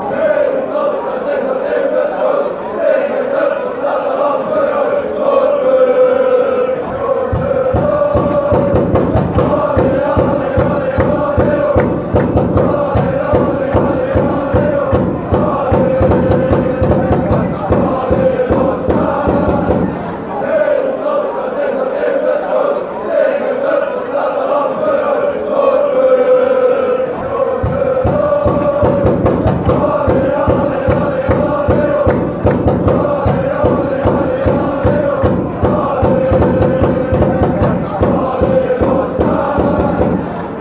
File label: allez oh, fan chants; luzern